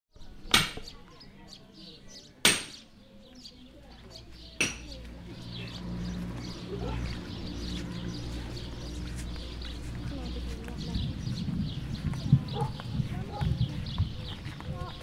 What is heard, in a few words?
Sunset into evening cicadas